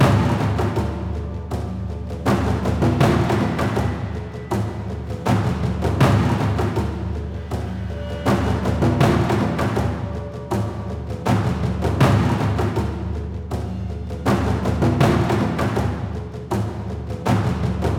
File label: Starting Line Music; Orbital Racer; PS4, Windows, Xbox One, Xbox Series X/S